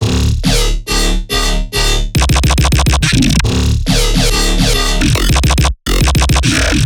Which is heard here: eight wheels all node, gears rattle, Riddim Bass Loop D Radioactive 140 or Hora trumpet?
Riddim Bass Loop D Radioactive 140